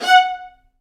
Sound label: Vla A F#4; Digital Sound Factory Virtuoso